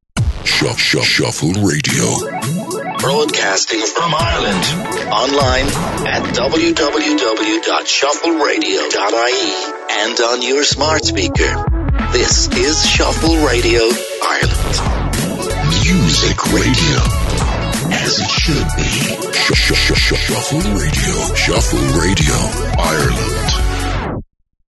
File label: Long Jingle With Bed; station identity jingles; shuffleradio